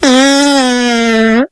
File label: fart